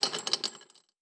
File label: Chain; Fantasy Sounds Bundle; Craft